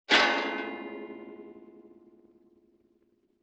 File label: Detuned Guitar Strum; Vintage Cartoon; Musical Elements